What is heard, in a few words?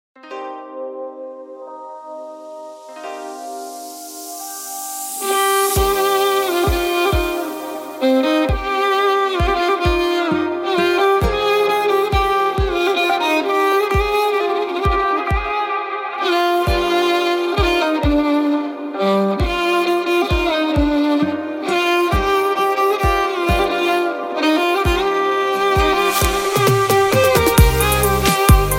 Violin